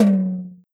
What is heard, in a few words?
TOM; osu